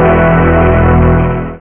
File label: Song; Realmz 6.0.3; The Family Jewels